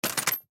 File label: Coins Money Drop, Coins Drop In Bag; Foley; Money Sound Effects; Objects; Blastwave